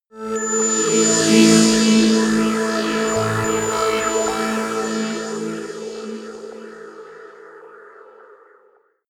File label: sci-fi new galaxy, drones